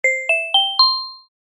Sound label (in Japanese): 場面切り替え ベル